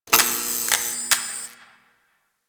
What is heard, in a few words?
Derelict Light Flicker; Ambience; Props; Warframe; Orokin Derelict